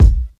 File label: fat bass drum a sharp key; kick drum samples